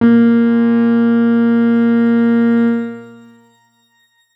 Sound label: X Grain A#2 pp; healing soundscapes; Ableton